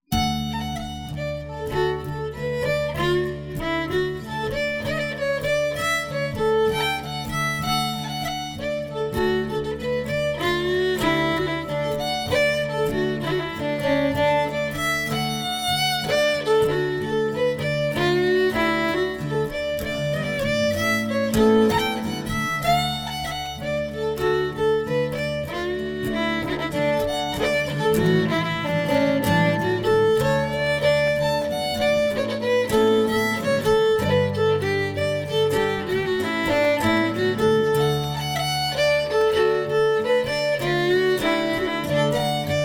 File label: champaign jig half speed